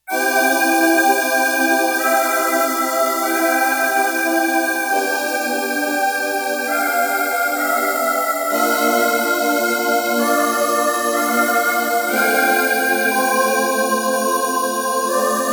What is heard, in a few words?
Syn Vox, Roland JX-1; Factory presets; Additional factory patches